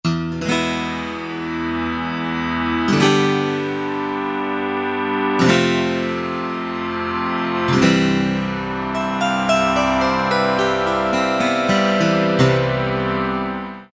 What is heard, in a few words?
Guit-Piano, Roland U-110; Factory Patch